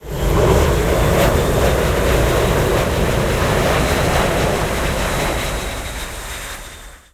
dropship evasive, ambience; Quake 4 Alpha; id software

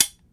Shotgun Dry Fire; Foley; Doom

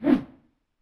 Imp Attack Whoosh, imp fireball; DoomRTX

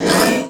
imp pain, monsters; Doom

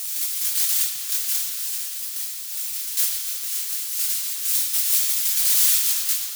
cryo loop verb; Quake 4 Alpha; ambience cryo

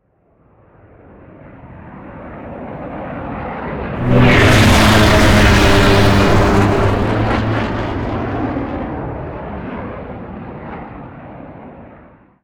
strogg flyer by, vehicles; mono; Quake 4 Alpha

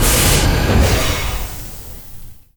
machine lift; Quake 4 Alpha; id software; lifts

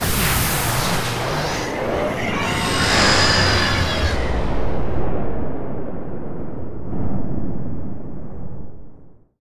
death, strogg; Quake 4 Alpha; id software; valkaryne

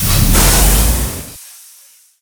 spacegun cannon recover; Quake 4 Alpha; id software; weapons; turret

